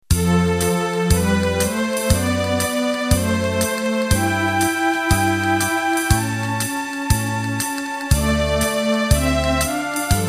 Broadway End

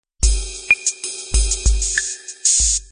16beat soul intro

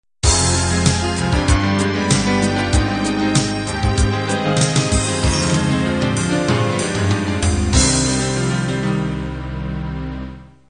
folk ballad end

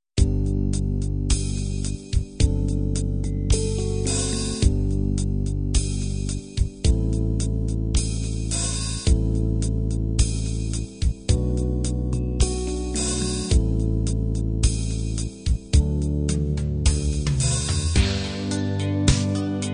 halftime ballad

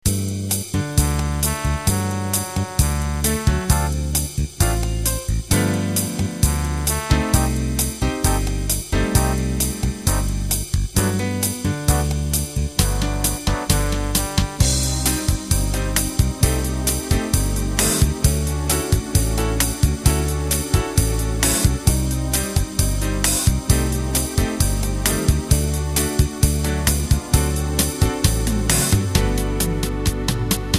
Pop Bossa; Piano, Nylon Guitar, Trumpets or Synth Strings and Brushed Drums. Works for Pop, Light Jazz Bossas and Ballads; Pop Bossa X & Pop Bossa Y

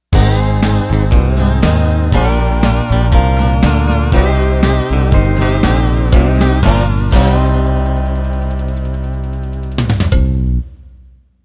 Modern Country End

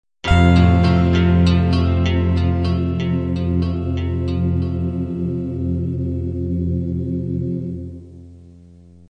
country-pop ballad end

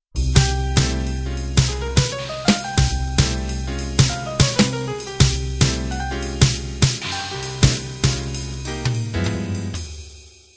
bop intro

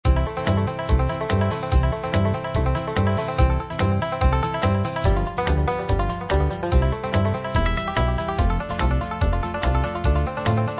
bluegrass

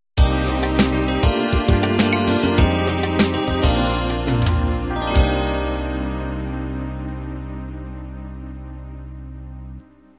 slow ballad end

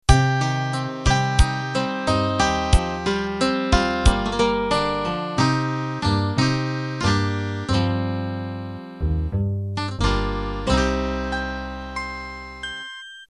8Beat slow 70s End

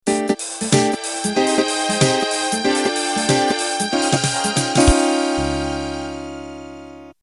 Swing Rock End

